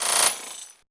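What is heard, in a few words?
glass uzi right; Spectrasonic Distorted Reality 1; BIZZAROS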